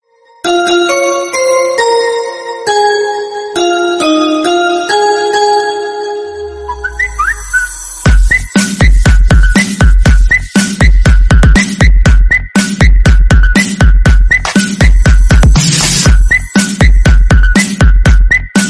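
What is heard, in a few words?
Whatsapp Remix, dzwonki na telefon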